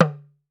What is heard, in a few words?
TAM TAM, African Drums